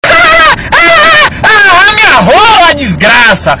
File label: hahahahaha